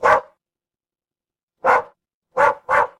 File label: animal dog bark